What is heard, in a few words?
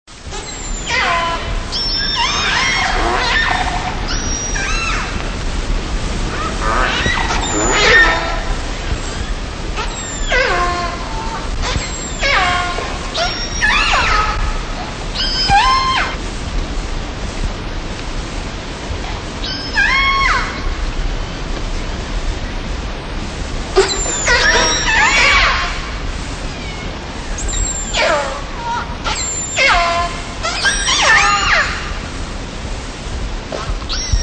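G clan, whales; Killer Whales (Orcinus Orca) are distinctively marked black-and-white dolphins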